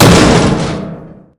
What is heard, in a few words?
breaching charge; Weapons; EK modern; Barotrauma